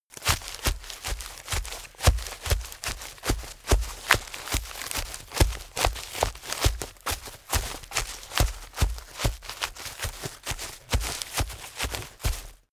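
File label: step forest run, footsteps; schritte; menschen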